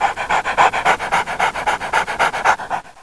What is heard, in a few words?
dogs